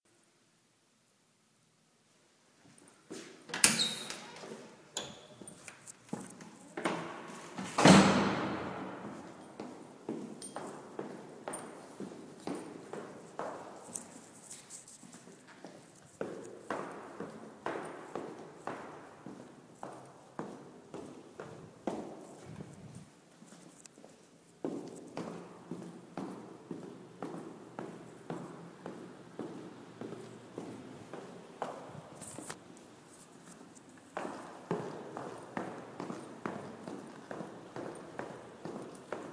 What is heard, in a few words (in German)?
Soundscape Schachenfeld